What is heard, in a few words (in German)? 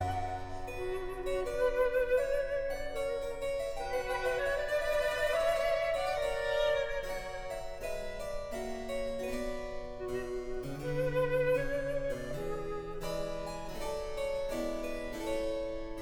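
orchester suite no 1 in c dur bwv 1066 courante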